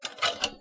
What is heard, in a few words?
mechanical